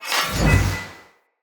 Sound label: Sword Whoosh Accent Heavy; Weapons; Swords; Fenn Jotar Sword